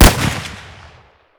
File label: toz fire distant; Escape from Tarkov